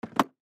Makeup Kit Down, Foley Makeup Kit Professional Set Down; Primping; Human; Blastwave